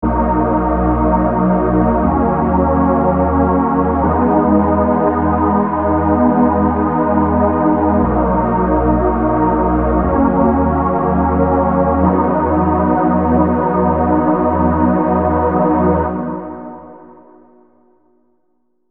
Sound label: B Major Prog, Chord Progressions